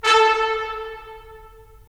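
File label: brass trumpets staccato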